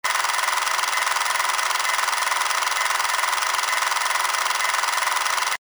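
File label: projector, device sounds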